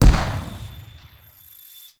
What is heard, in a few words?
Explosion; Mischief Rework; Warframe; Mods; Pet Mods